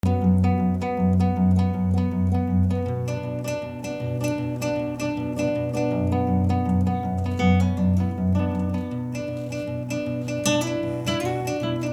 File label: Melody